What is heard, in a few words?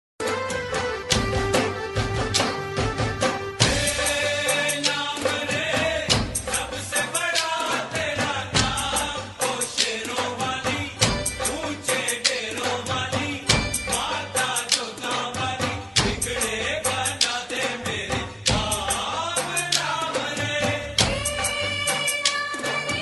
bhakti ringtone; maa durga ringtone; navratri ringtone